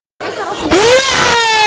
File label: LAAAAAAAAAA